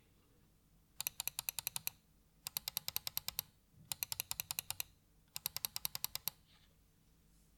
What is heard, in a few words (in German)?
Corsair Katar Links Rechts